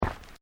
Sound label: Footsteps Sneakers Road Step; Footstep Foley; Human